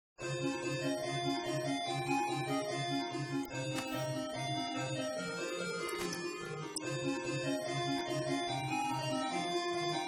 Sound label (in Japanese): ピコピコ